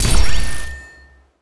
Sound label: imp spark light funnel